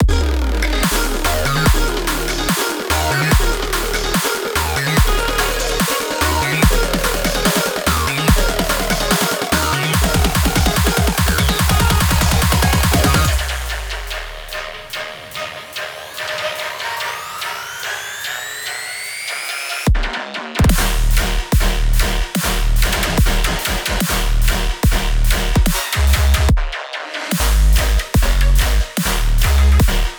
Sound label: Heavy Electronic Tearout; Heavy Electronic Music Pack Vol. 2